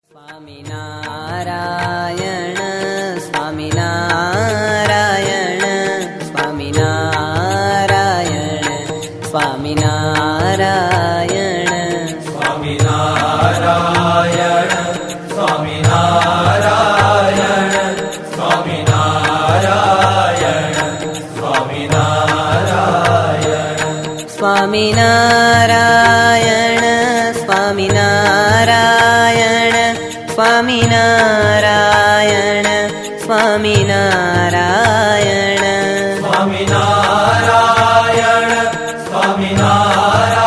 DHUN

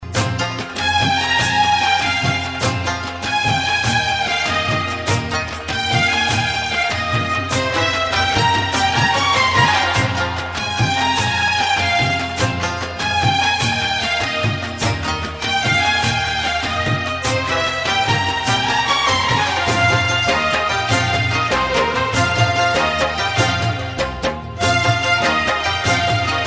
music

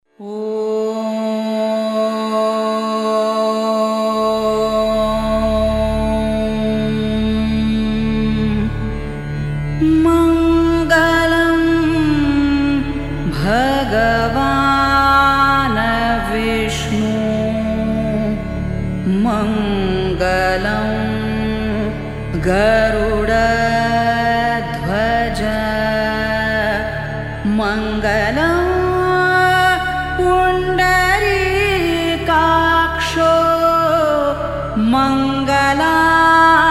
MYSTIC INDIA MUSIC

